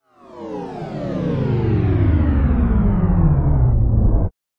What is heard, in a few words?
Descend Long Electronic Descend with LFE